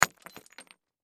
Brick Drop Break, Brick Drop Break Cement; Foley Brick Drop Break Cement Surface; Blastwave

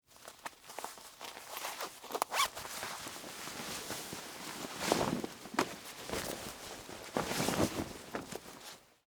Dress Pants Off, Foley, Clothes; Dressing; Human; BlastWave

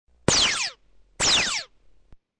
Lazer gun sound effect, two shots.; Laser Guns; Sound Effects:Guns

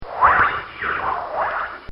Cartoon Sound Effects Swoosh; Cartoon Sound Design

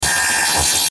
DIGITAL HUM, Digital Hum Stab Static; glitches; Blastwave

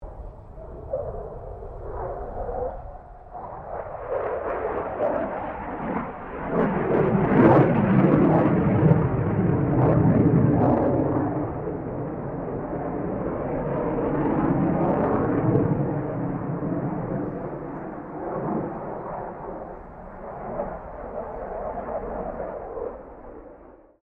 F16 Falcon pass distant, stereo; Airplanes; Vehicles

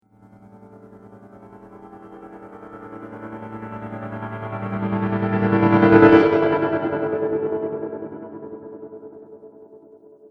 Cinematic Riser Pack; Rise And Dive Sound Effects

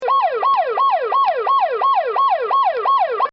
Boat Coast Guard Sirens; Electronics; Bobby Cole

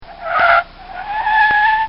Volvo Braking Hard; Cars; Vehicles; Bobby Cole

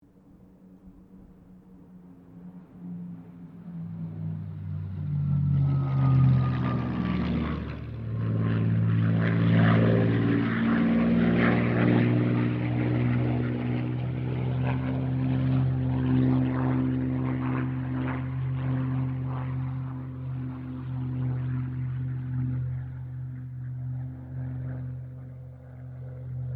AIRCRAFT SUPERMARINE SPITFIRE MK-XVI PASS

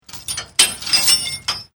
Chain Metal Clanging

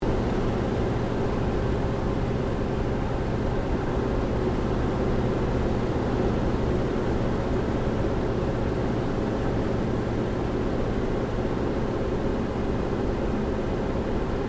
AIRCRAFT CA-28 CERES LANDING INTERIOR; Airplane; Vehicles

